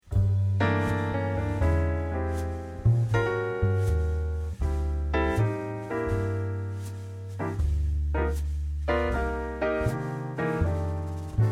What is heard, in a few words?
ensemble